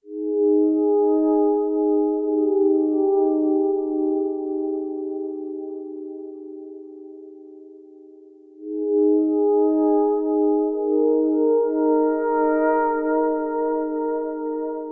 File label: ambient